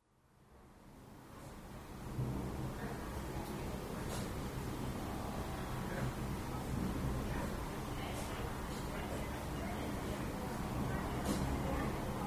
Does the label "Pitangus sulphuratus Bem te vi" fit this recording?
No